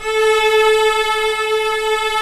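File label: Cello E; AKAI S-Series CD-ROM Sound Library VOL-2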